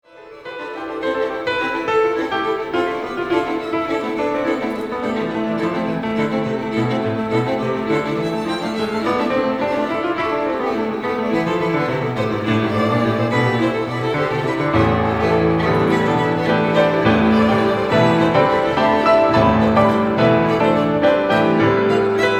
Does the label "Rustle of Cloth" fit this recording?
No